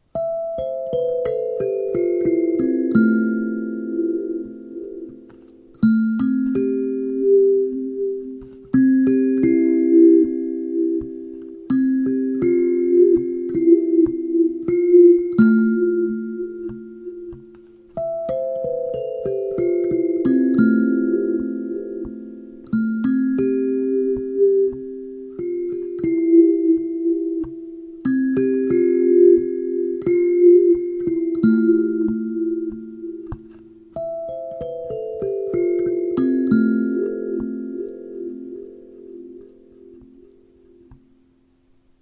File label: sansula